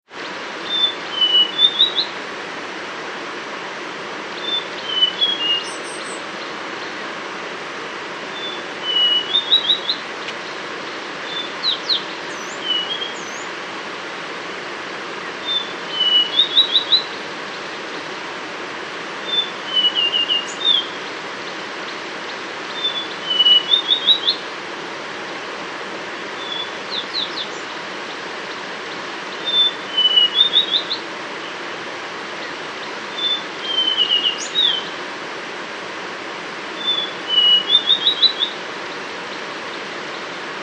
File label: brown scrub robin